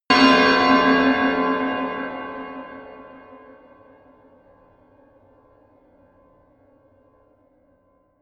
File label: bell hits horror